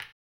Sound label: HAMBONE; ILIO; Vocal Planet VOL-2; Blues & Soul